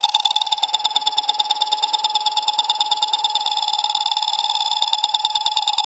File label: slot machine spin; gambling